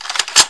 trg clip in

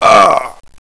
pain, bot; cooked

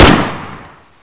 l85a2 shoot

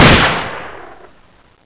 shoot

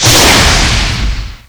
flamethrower redirect; chicken fortress 2

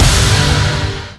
Power Hit

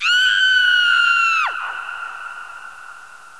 scream

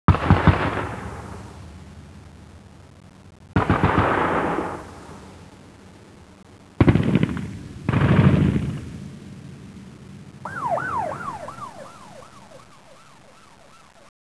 bazooka ambient

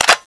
clip out, weapons; balrog7

